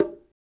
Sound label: Congas, African Contemporary